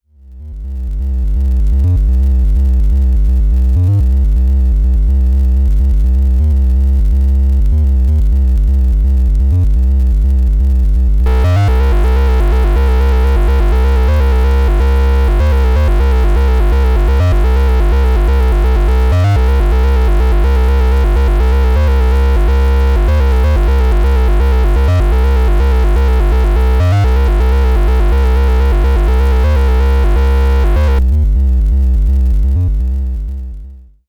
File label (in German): Xaoc Devices Leibniz Binary Subsystem Tri Wave Bit Reduktion